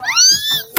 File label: yeeeeeeeeeeeeet